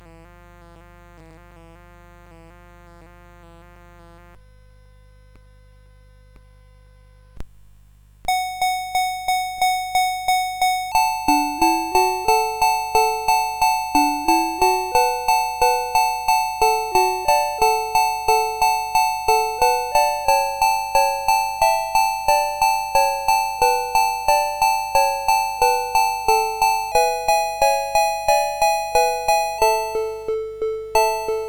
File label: Rain Drops, CSG8580R5